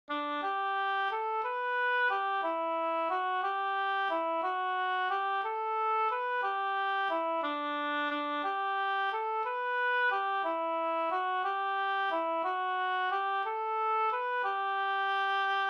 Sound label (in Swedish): vals Brudvals